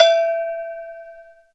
agogo, Yamaha TG-500

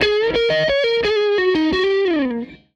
Tele Guitar Loop

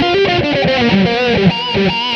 guit lead; Sonic Foundry (Sony Creative Software); Ugly Remnants VOL-2

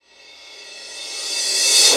rev Crash; 300 Drum Machines

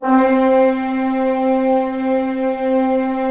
ORC C3 PP, Orchestra; Sound On Sound - Peter Siedleczek's Orchestra

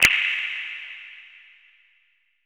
HIT NOISE; AKAI S-Series CD-ROM Sound Library VOL-5

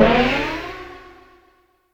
TAMTAMY, Yamaha DTXpress

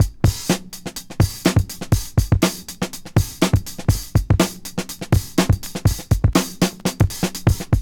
breaks

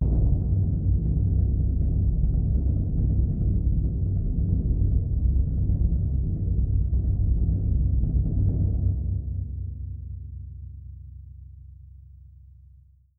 BD2, Percussion; Miroslav Vitous - Percussion & Harp

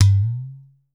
udu; Voice Crystal - Steve Reid Definitive Percussion

